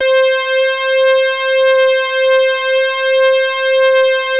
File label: BROOMSTICK; Masterbits; Vintage Voltage